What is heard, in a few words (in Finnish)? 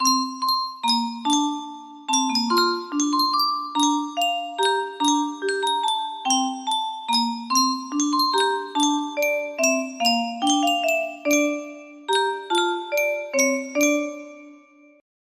Yunsheng soittorasia, music box melody